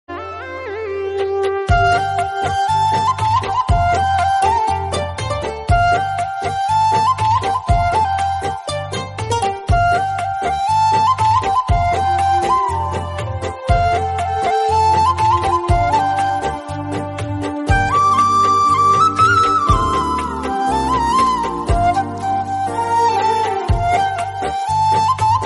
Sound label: SAD BGM BANGLA RINGTONE